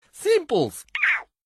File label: Meerkat Ringtone